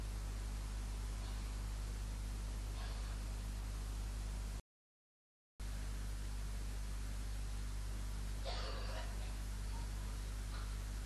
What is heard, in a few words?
car parking